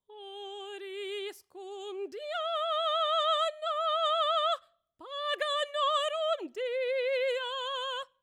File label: choir